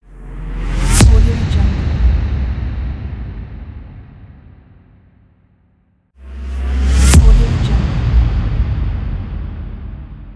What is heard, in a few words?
boom sound